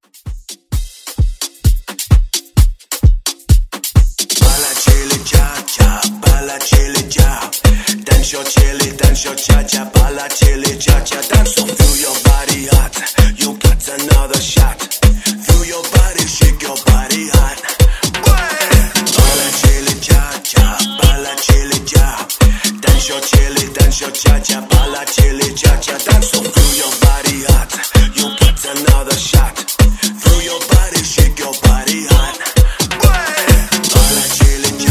Afrobeat; Redrum

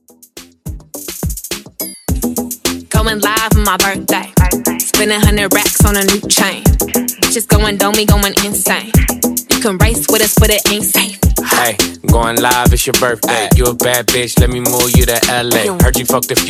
Extended Redrum In Outro 105 BPM

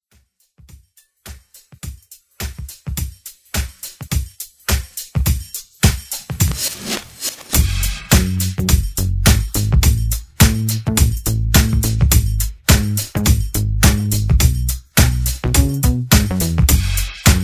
90s Redrum

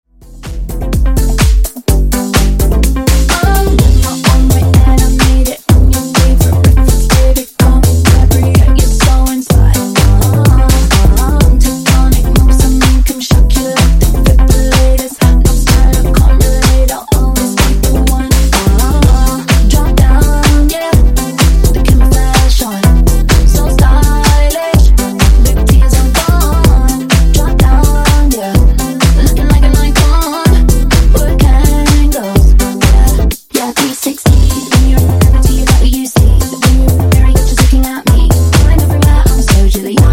Funk Uptempo House 126 Bpm

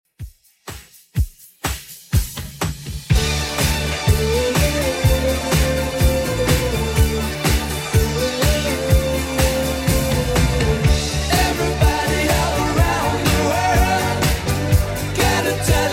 80s Redrum 124bpm CLEAN

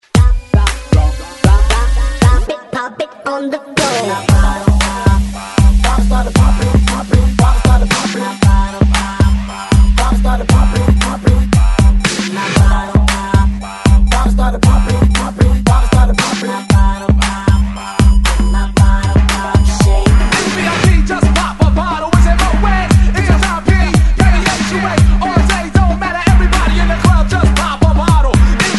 20s Hype Re Drum; DJDOLLS

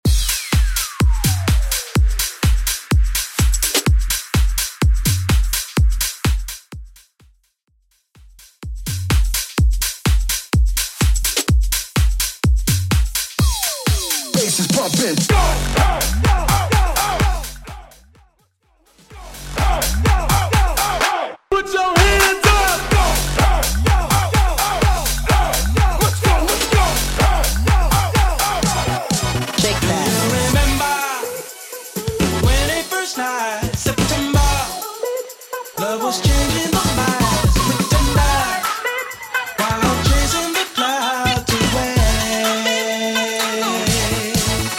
70s Future House; VIP Mainstream Hype Edit

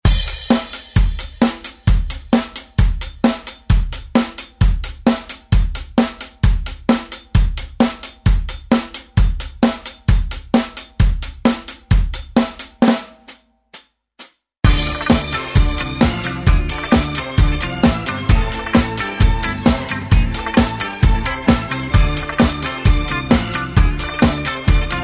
70s Re Drum 132Bpm

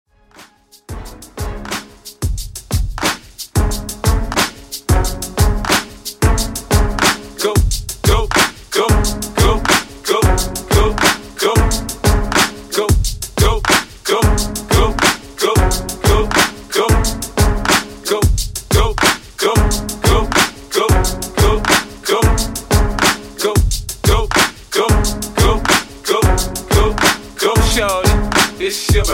Workout Redrum 90Bpm

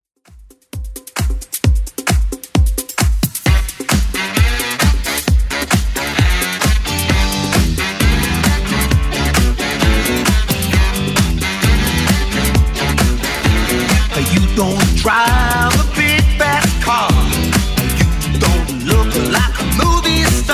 70s Club 132 bpm

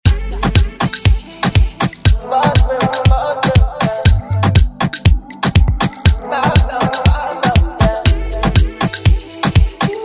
Afro Rton; Redrum

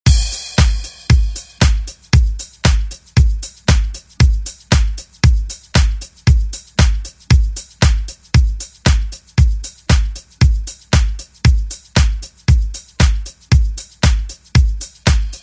80s ReDrum; DJ Mhark

